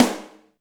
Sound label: PICC ROOM, SNARES; Back In Time Records; Big Bang